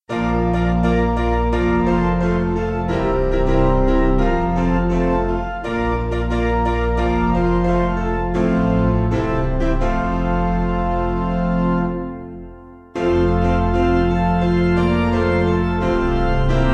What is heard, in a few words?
Piano